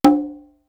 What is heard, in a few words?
HI OPEN; Univers Sons; Basicussions